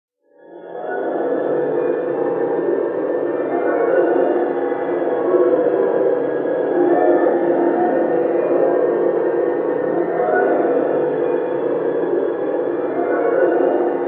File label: planet; background; TOS; Melkot